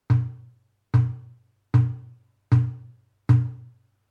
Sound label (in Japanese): mokugyo; 木魚（もくぎょ） 読経の調子をととのえるために使われます。